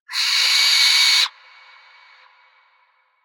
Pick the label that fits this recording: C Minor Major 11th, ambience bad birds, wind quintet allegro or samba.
ambience bad birds